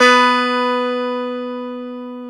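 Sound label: E 12 String guitar; Club-50 - Foundations Roland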